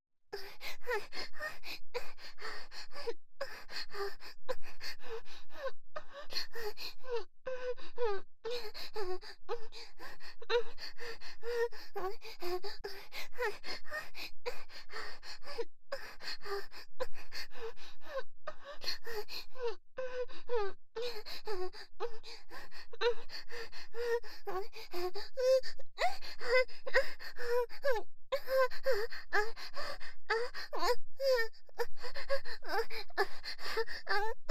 喘ぎ声リピートボイス

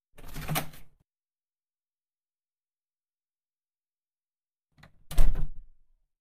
耳舐め手コキ（SEのみ）, ASMR

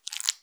深めにストローク, ピストン運動 激しめ; １ストローク単発

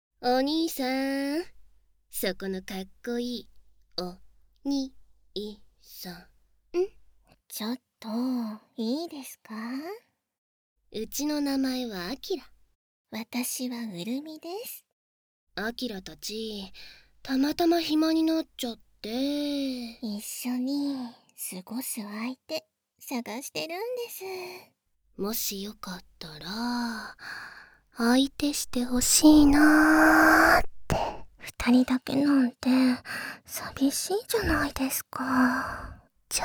媚び媚びビッチな男の娘とダブルベロチュー兜合わせ SEEF無し

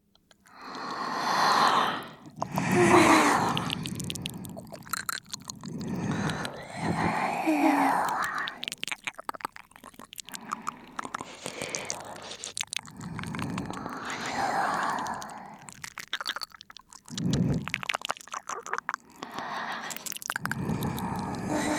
優しくぺろぺろ 声なし 特殊両耳; カスタマイズトラック; 両耳同時舐め

